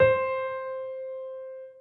soft slider tick; osu!

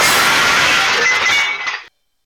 soft hitfinish; osu!

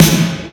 normal hitclap; osu!

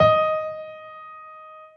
soft hit whistle; osu!

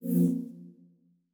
side menu fly in; steam; deck

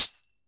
soft hitwhistle; osu!

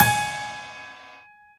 normal hit whistle; osu!

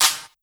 soft hitwhistle; osu!

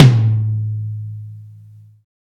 Tom, drum hit; osu!

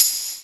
soft hitwhistle; osu!

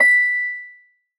soft hit whistle; osu!

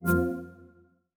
deck ui into game detail; steam; steamui

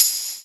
drum hitwhistle; osu!

